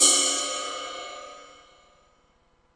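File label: Yamaha RS7000 hihat cymbal, Drum machines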